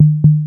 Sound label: MP REP. TOM; Back In Time Records; Korg Universe VOL-1; DRUM FAMILY